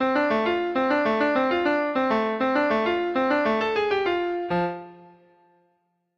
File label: ringtone packets